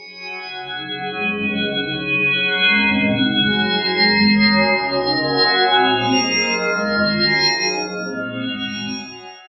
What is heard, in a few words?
Padscape